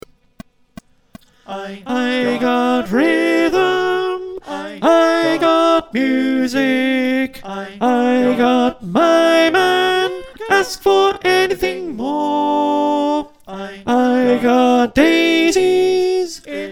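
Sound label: alto, choir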